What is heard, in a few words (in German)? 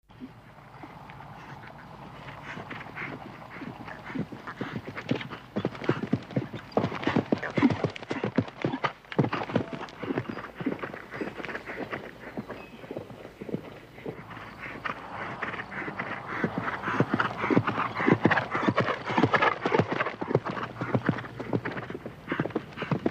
Pferd Galopp; Tiere